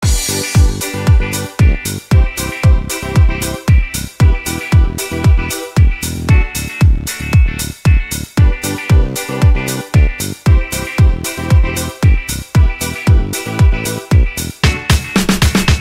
instrumental

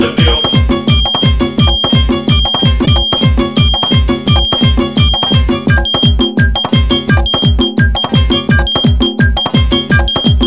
beep beep beep mix

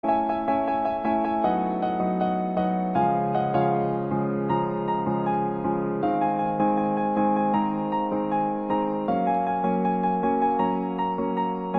piano

